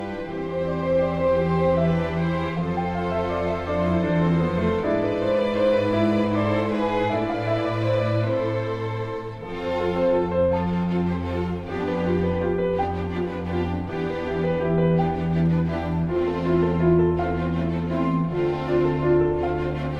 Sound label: Strings Harp and Piano